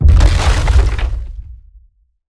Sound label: Rock Prison Formation; Evolve; Behemoth